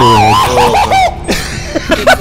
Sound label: Tom and Jerry Death Sound